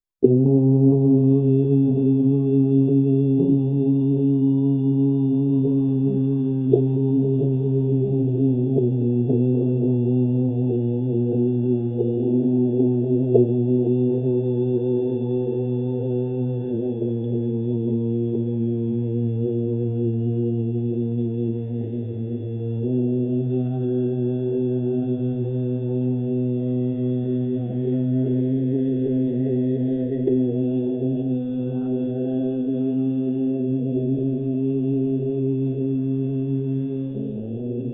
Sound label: historic ambience with; cultural